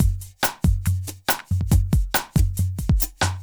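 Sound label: industrial 140 kit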